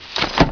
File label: BUTTON